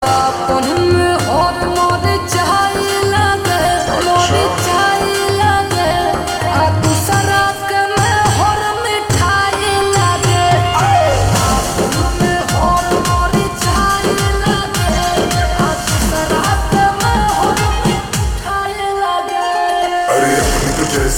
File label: Ringtone Slowed Reverb